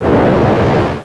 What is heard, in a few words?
flamer fire, weapons; q3rally